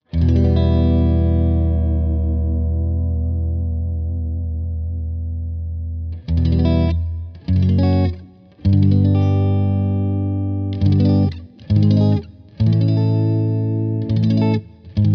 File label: neck clean